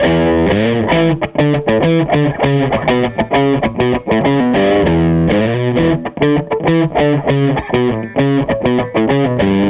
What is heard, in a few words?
SG Guitar Loop